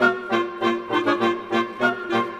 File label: Winds, Orchestral; Sonic Foundry (Sony Creative Software); Orchestral VOL-2; Modern 06